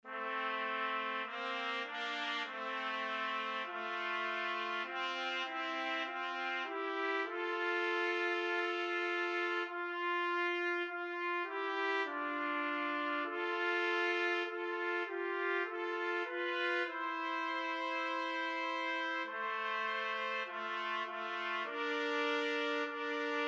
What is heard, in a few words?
savior again 2 trumpet